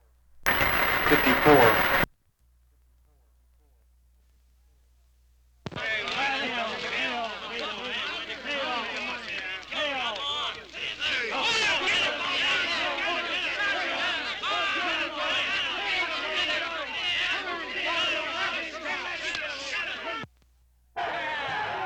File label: Men Yell Riot